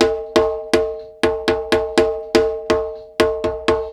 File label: NICE PERC, Roots Disco; USB Soundscan vol.32; AKAI